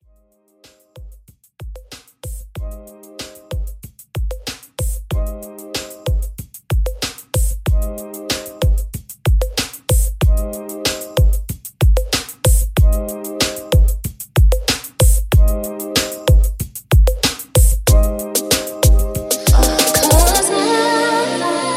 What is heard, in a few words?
RB Mashup Re Drum 94 BPM